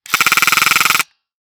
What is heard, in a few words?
Foley Toy Gun Pulling Trigger